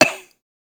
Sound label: cough; soundscrate